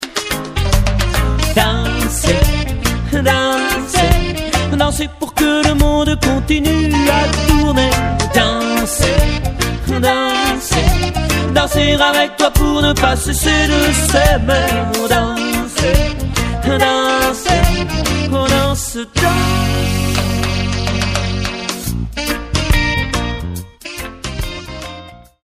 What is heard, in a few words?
reggae